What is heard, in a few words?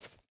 footstep burlap dirt jump start; Rust